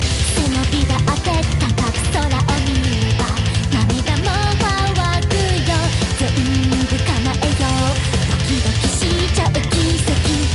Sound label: metal